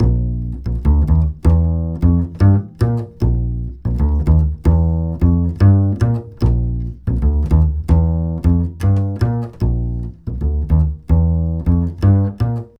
075 NuJazz Bass Bb maj; Pro Tools Essential Loops; Digidesign

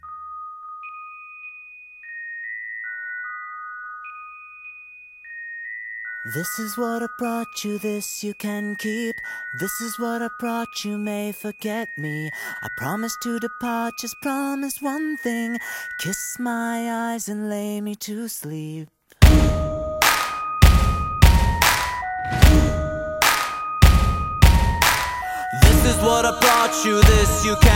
Prelude

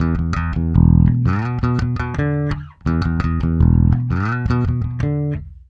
084 Pop E-Bass Emaj; Pop Rock; Pro Tools Essential Loops; Digidesign

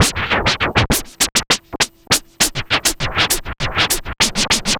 Vinyl Scratch Snare

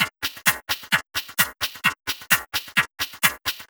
130 Dance Perc Fx; Percussion; Pro Tools Essential Loops; Digidesign

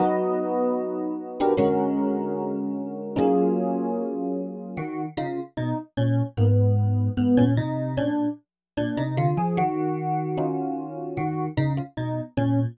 075 Jazz E-Piano Ebmin; Pro Tools Essential Loops; Digidesign

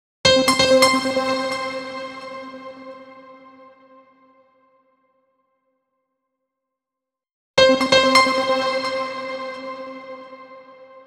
131 house piano Cm; Dance DJ; Pro Tools Essential Demos; Digidesign; Essential Loop Demos; Demo Session - Dance DJ

